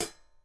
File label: Brush Hat, Drums; PX7; Digital Sound Factory Protean Drums